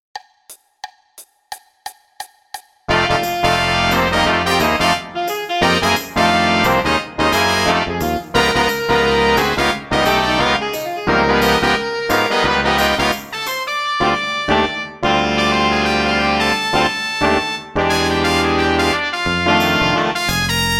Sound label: Drums PLAYALONG Jazz 2nd LINE; NMEA Jazz Etude